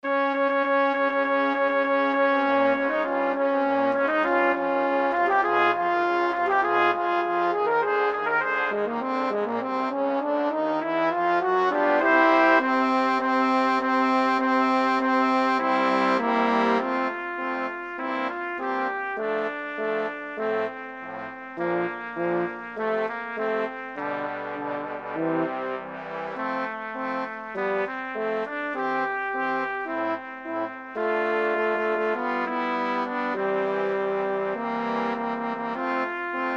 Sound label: Brass Quintet; Pomp and Circumstance